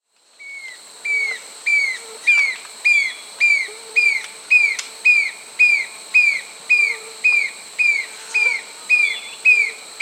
Ihering's Antwren (Myrmotherula iheringi), Peru, Birds of the Amazon; S. America